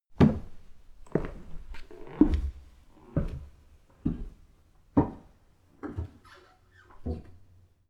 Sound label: step stair walker